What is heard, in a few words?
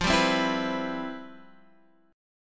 C Major 7th F; strummed; Cmaj7/F